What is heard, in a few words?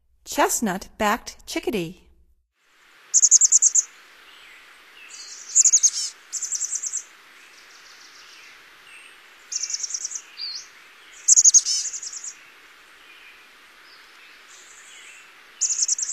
Chestnut-backed Chickadee, Songs of Birds of North America; Stokes